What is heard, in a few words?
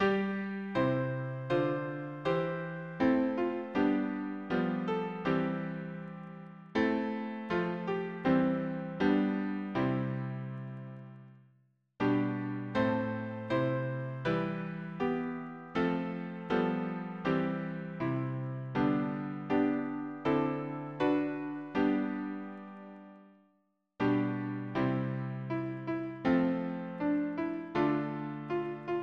harmony piano